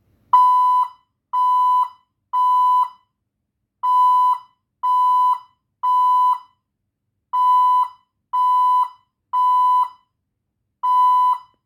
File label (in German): signalgeraete ton58